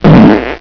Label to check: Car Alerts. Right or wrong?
Wrong